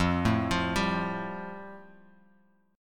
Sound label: F Major Add 9th; Fadd9 arpeggiated; JGuitar